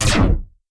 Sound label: Nano Blade